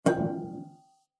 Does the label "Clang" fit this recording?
Yes